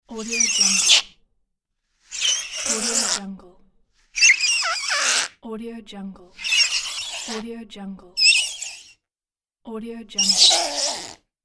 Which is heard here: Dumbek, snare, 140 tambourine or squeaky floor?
squeaky floor